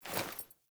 Foley Action Default Sword Throw, Foley Default Clothing; Characters; Player